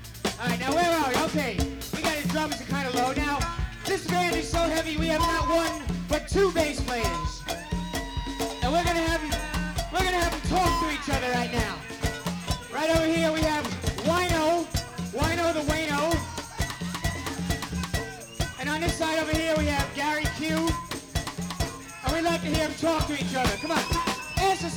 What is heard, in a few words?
Uh Oh Bass Solo